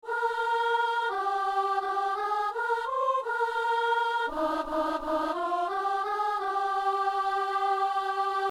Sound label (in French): psaume soprano; Psaume 118 (119); Messe du 17 janvier à Saint-Denis